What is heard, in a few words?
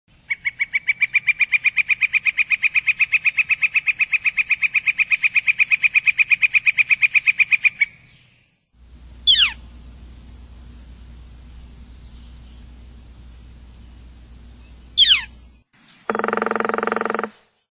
Northern Flicker; Birds